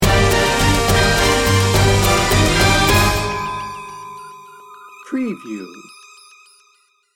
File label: battle lines sting bumper